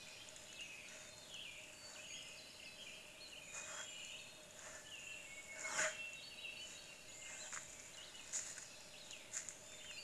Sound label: moose male grunt